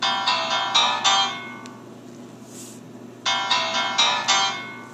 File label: piano riff ringtone; apple iphone 3g 4